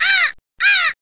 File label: Bird Caw, cheesy lo-fi